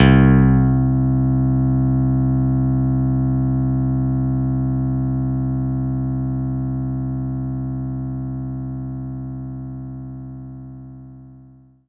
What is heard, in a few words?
CP 80 EP C2